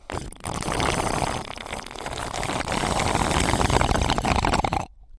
Straw Slurp, The sound of someone slurping something through a straw; Straw Slurp 3